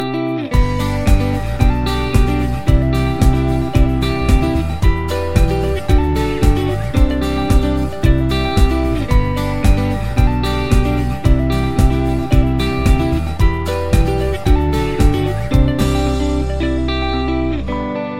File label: ambient